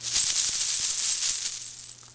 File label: belt, Belly Dance Belt with Coins